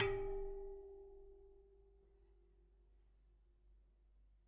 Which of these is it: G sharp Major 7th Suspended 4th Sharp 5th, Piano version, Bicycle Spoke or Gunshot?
Bicycle Spoke